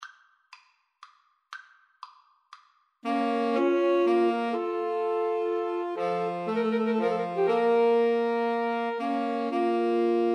pastorale, 2 Altos Tenor Sax

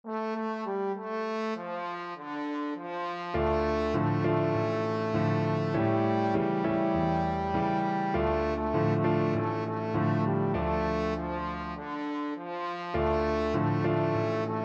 aint gonna let, trombone

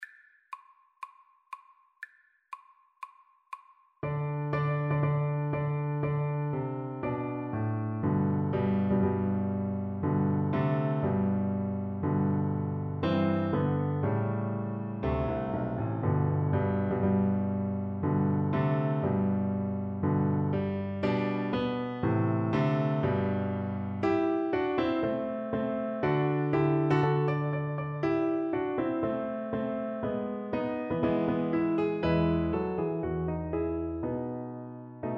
wedding march, cello; wagner

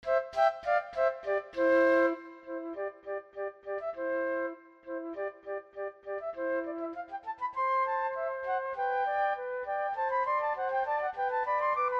mozart k487 no1, flute duet

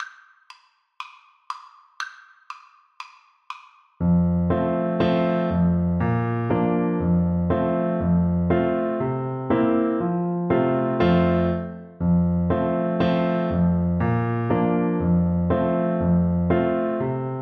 old macdonald, bassoon

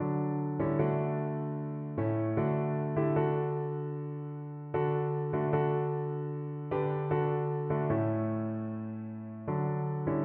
watchman tell us, piano

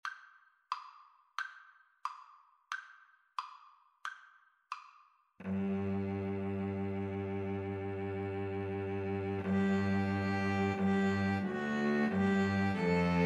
2 Violins Cello; capriol pavane

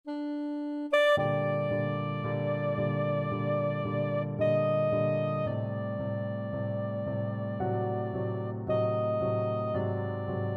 soprano saxophone; chopin emin

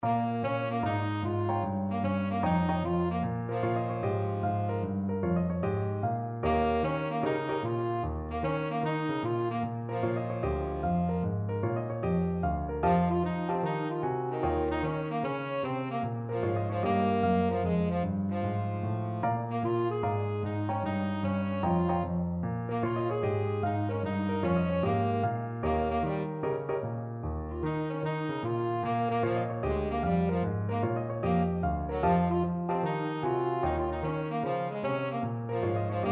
shuffle, tenor saxophone

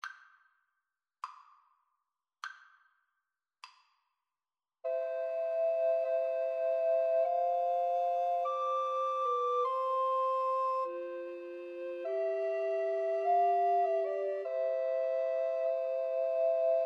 skye boat, Recorder Trio

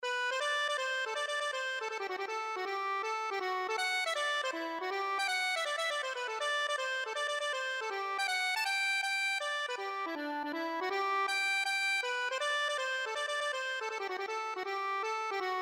accordion

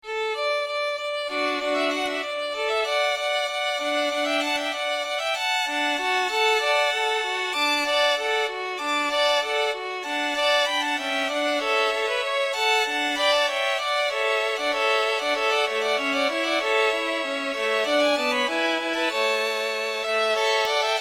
violin trio; rejouissance

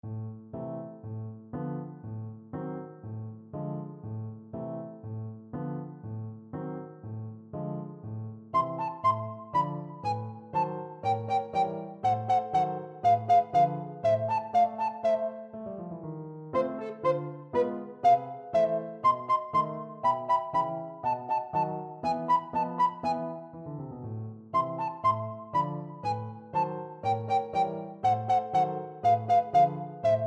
sugar plum, alto recorder

